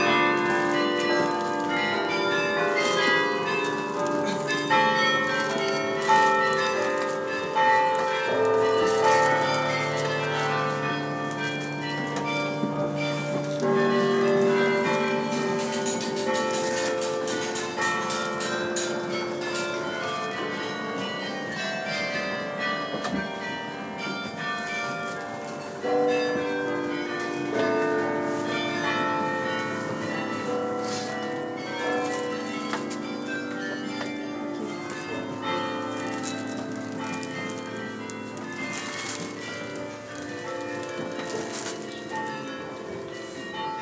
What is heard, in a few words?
bruges belfry bells